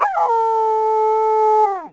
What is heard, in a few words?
Coyote